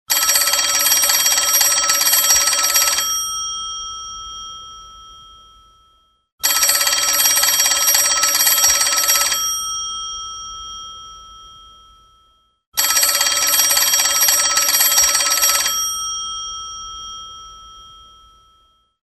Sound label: Old Telephone, ringtones